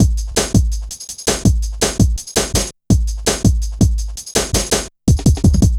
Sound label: Ezee Rolla, Drum Loops